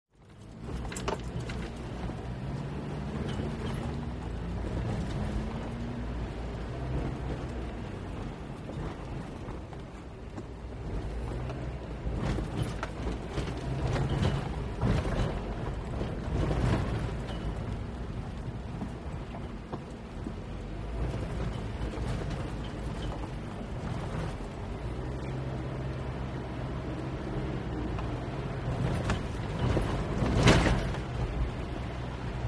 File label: Suspension Clunks, hits & rattles